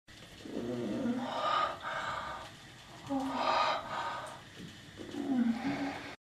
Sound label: moans; The Witcher Hunt